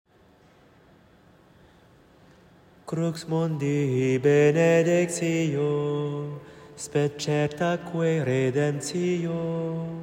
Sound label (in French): sexte latin; vendredi saint careme; semaine sainte